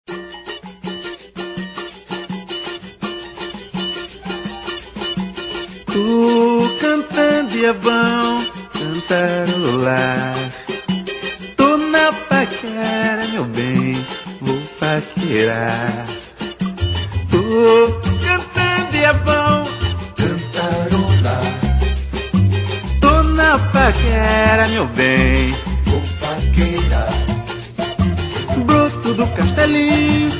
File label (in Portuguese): Samba